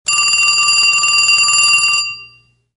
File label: Phone Ring